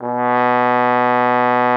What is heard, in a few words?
TROMBONE; Xsample VOL-3